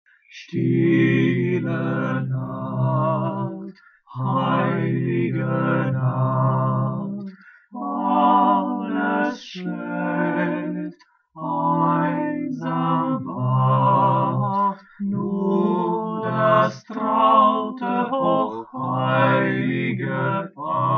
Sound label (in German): Die heilige Nacht vocal